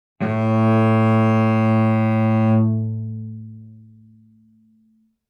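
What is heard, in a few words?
Cello arco fortissimo sul G A2; MIS Pitches - 2014; Strings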